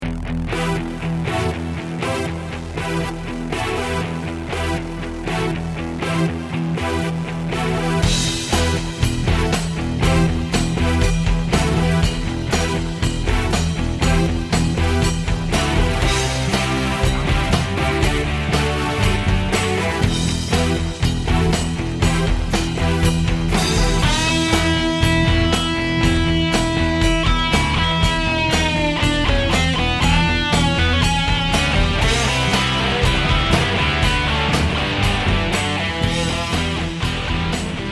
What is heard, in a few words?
Glam Rock